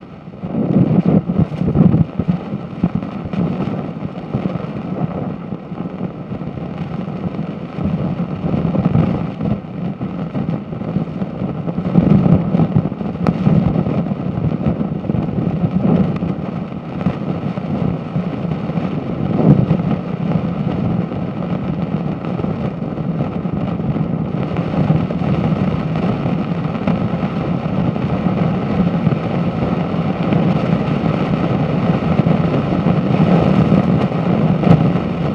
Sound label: Old Radio Noise Defective Medium Wave